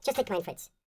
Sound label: YODEL YELL, World Voices